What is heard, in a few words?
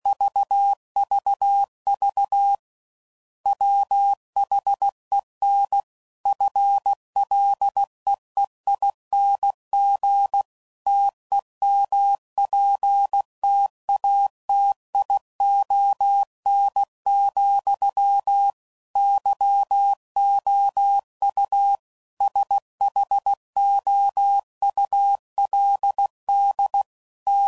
CW Code Groups 5WPM; Sayings; Chuckles